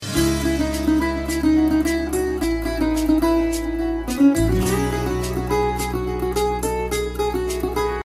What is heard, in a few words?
latest guitar ringtone